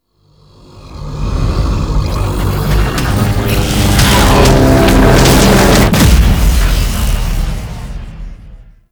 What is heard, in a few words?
Transition Stinger; Warframe; Entrati Labs; Defend Lab Transition